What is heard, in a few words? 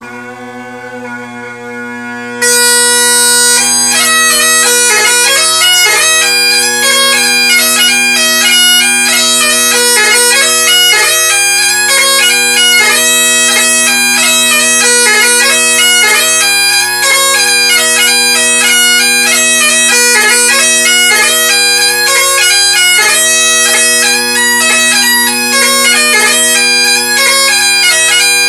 Scottish Birthday, Pipers; Fife based Scottish Bagpiper